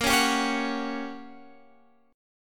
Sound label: G Minor Major 7th Flat 5th Bb